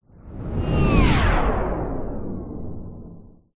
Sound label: sound design whoosh into slow motion; zapsplat